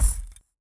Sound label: metal, Item; RYL